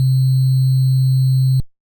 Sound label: waveform sine